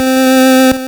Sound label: Hard PCM Chip; chiptune